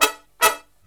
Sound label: Brass Riffs; USB Soundscan vol.29; AKAI